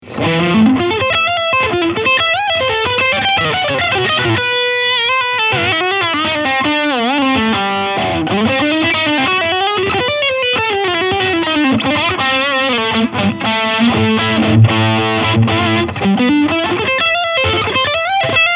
Classic Overdrive; Blackstar HT-20R MkII

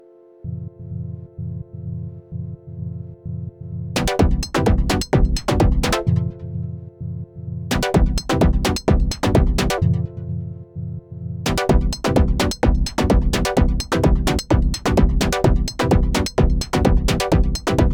Korg minilogue xd

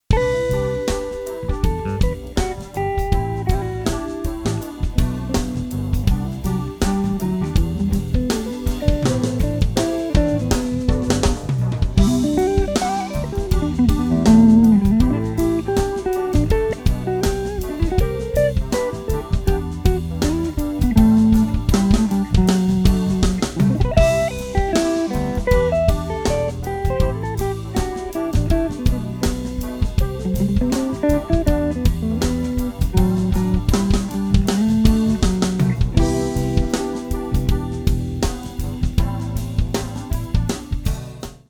softie

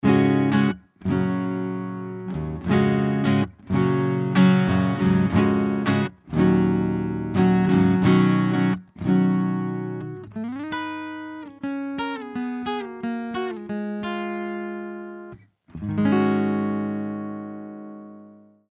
BOSS VE8 GIT ENH orange 50

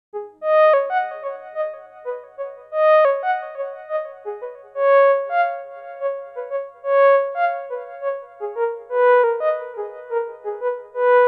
teisco60f solo

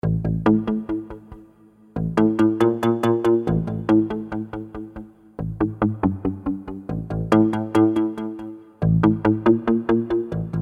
Twang bass; Pro Vs Mini

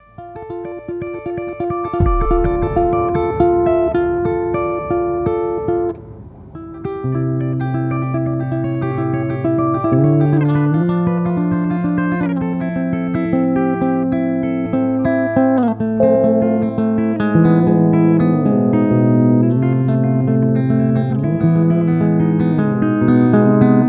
Silhouette Bridge Clean Tone, guitar; Harmony Guitars

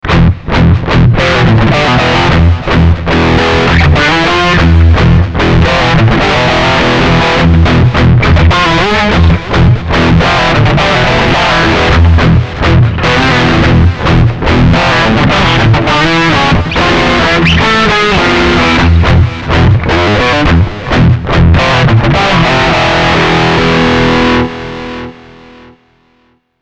Preset Vtg; Theta

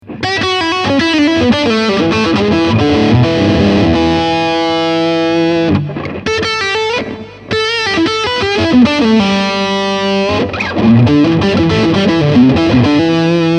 Max. Gain Overdrive on

